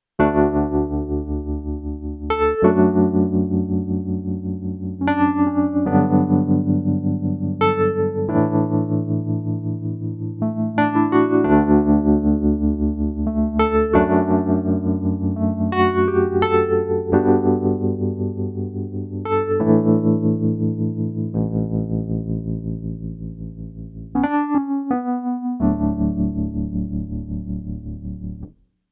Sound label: Kawai ES 920 60s E Piano